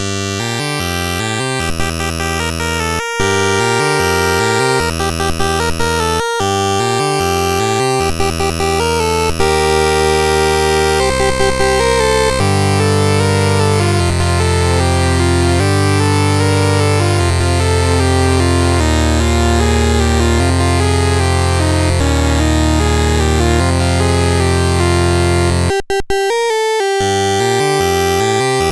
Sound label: sid, MOS6581R4; Escape from New York